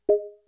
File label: move focus tv, Panasonic; Panasonic 2015